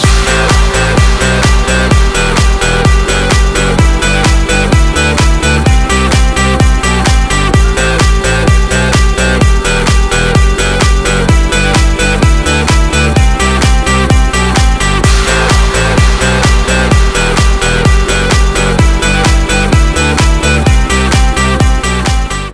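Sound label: from the Samsung Galaxy S5 advert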